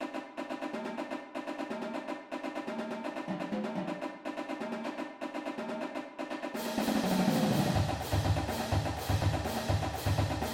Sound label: tenor intro